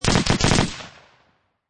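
Machine Gun Heckler and Koch MP540 Short Burst Triple; H+K Mp540; Machine Gun Sound Effects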